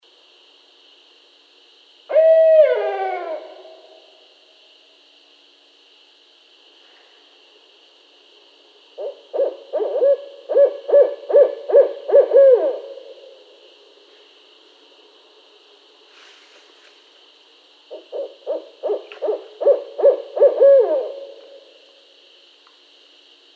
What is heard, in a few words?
Barred Owl